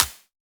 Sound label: Clap, Psytrance